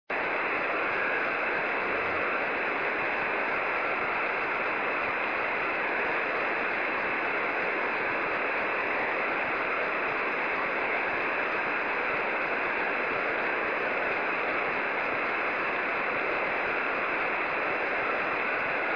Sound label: 5G jt65c, Mitake; 晴、気温10℃ 湿度45%; ANT方向:御岳山; WSJT JT65C 伝播距離：120km; JH1UGF 横浜市; JN1AYV 茅ヶ崎市